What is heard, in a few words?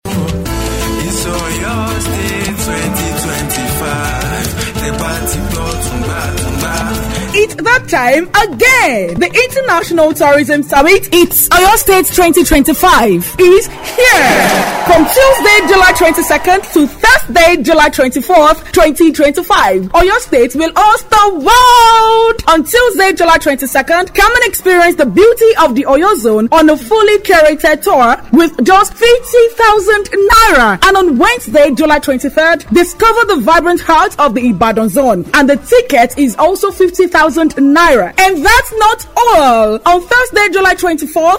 tourism theme jingle; OYO